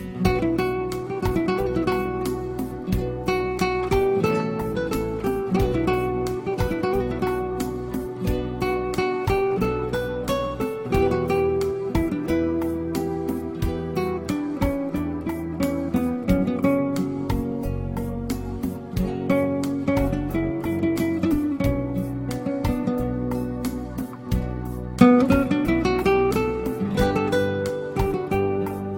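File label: romantic guitar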